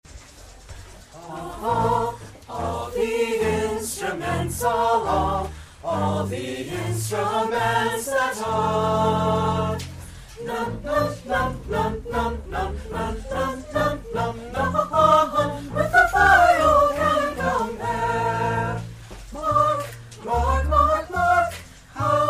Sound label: Purcell Instruments